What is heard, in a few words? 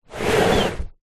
household fabric storage box drag on carpet